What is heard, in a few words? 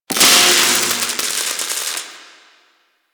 Broken Light Flicker; Warframe